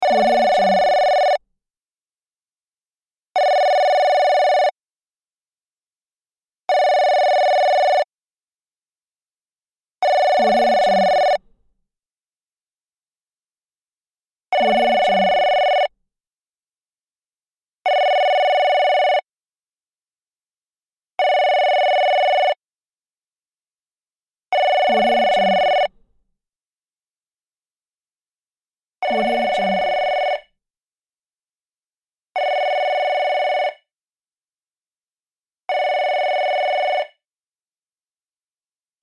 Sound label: telephone ringtone